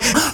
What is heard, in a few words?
breath